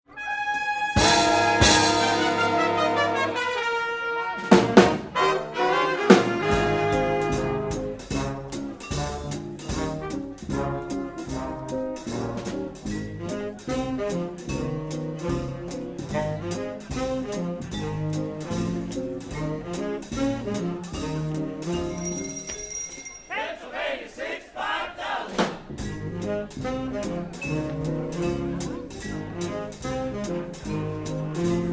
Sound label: Swing Orchestra